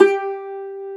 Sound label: Cumbus Saz G3; Multisamples; Digital Sound Factory Planet Earth